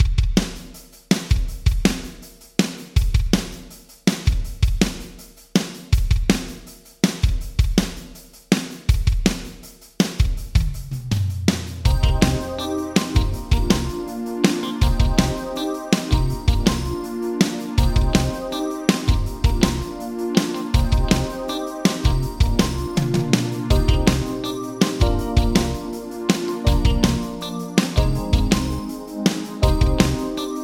Drums Yamaha Rev7 Percussion Plate 1.0s Vintage Digital